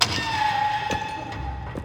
door opening; We Were Here Forever

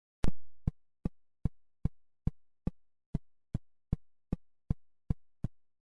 stairs; Little Computer People; gamesoundopedia

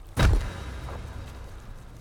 box; gris

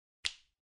ui button

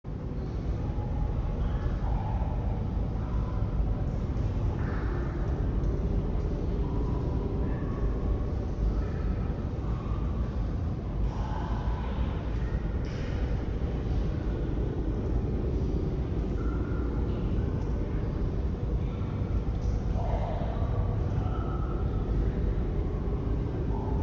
cave; kena bridge of spirits

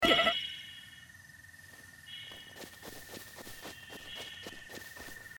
almost no life; Zelda - Breath of the Wild

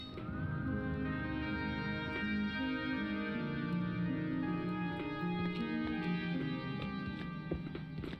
task completed; We Were Here Forever

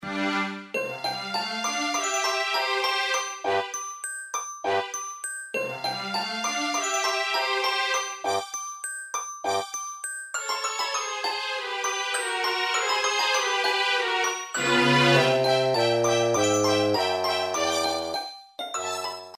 normal track; gamesoundopedia

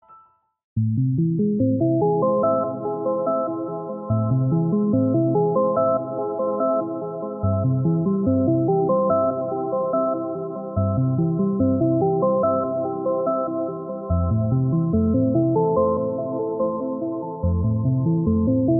resurrection loop; celeste

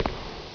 step; Metal Gear Solid 4; gamesoundopedia

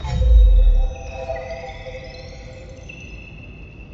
shield; kena bridge of spirits

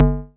glass bump; duck game

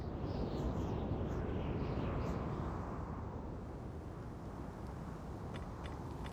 battlefield; rome 2; rome total war serie

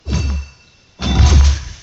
rott attack; kena bridge of spirits

